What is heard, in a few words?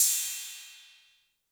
Cymbal 808 Tape Decay, Clean